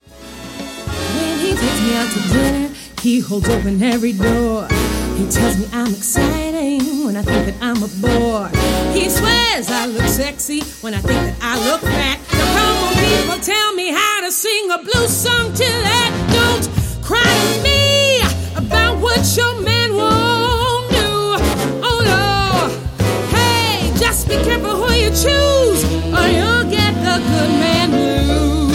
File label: Blues; Good Man Blues